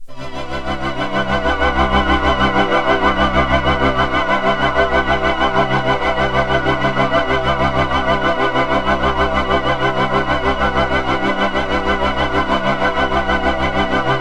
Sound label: poly pad devtronix